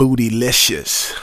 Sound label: Rap Vox